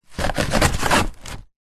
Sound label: foam packaging into cardboard box